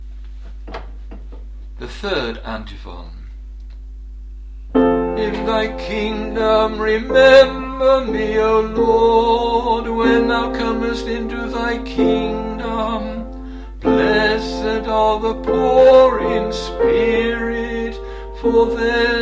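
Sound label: third antiphon, Music for the Divine Liturgy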